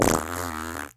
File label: Fart